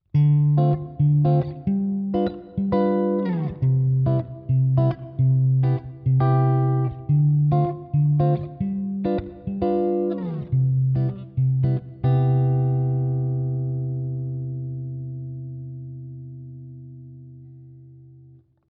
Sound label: Jazz 2 5 1